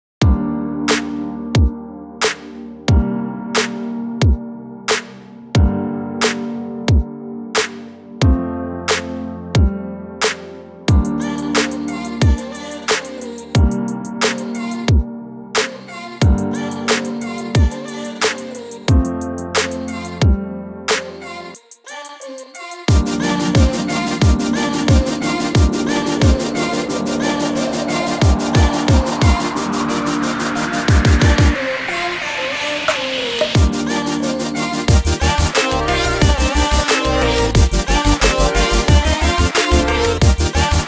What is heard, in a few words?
Winter Beats